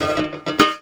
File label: Classical Guitar Extended Technique